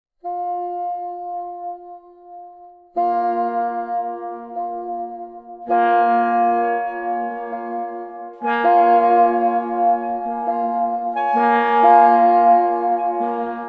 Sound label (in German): Glockenversuch; gullinkambi